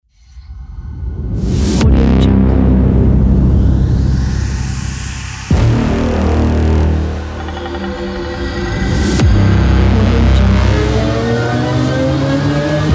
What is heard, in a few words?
dramatic action rewind logo